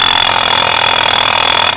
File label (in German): WECKER